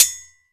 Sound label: SPOKE HHF, SPOKE HI HAT